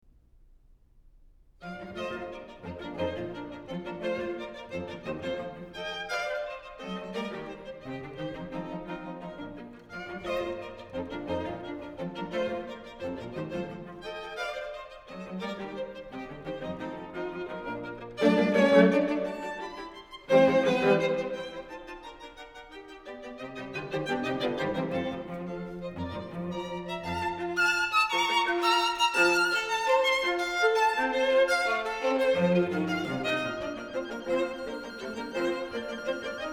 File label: String Quartet No. 6 in B-Flat Major, Scherzo (Allegro); Trio; Ludwig van Beethoven; Beethoven - String Quartets No. 1-6, Op. 18